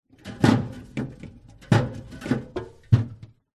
drink soda cans multiple movements set down inside fridge